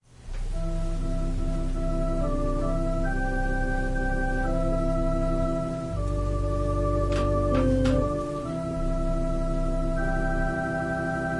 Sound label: Organ prelude